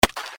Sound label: F note